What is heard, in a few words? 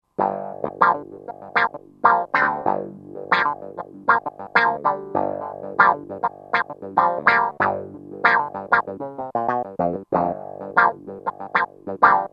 WahWah Clav; '60s & '70s; Roland SR-JV80-08